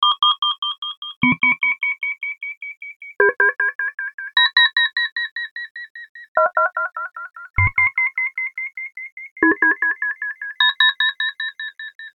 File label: Creep beep